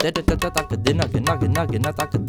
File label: INDIA L, Heart of Asia, IND ENS